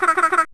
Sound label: Voice Dog; Princess & Conquest v0.20.06